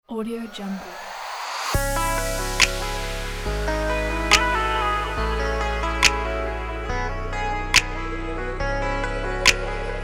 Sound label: lovely chill trap